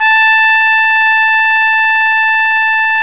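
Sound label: BRA TRUMPE, trumpet, brass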